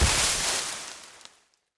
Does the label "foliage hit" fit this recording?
Yes